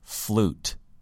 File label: flute